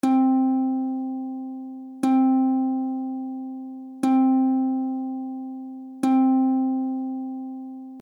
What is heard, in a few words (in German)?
ukulele stimmton c